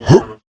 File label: Jump Sound; DoughnutQuest